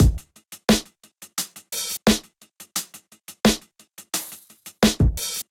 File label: Deep Space Kit, Golden Era Hip-Hop Drums; Ableton; Sound Oracle